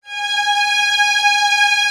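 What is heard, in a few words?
Vio legato G#4; Digital Sound Factory Virtuoso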